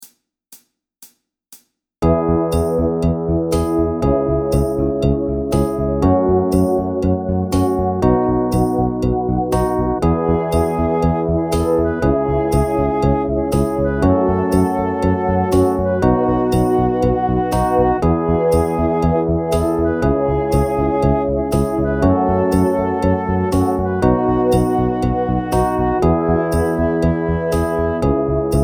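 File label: Drum Groove Fast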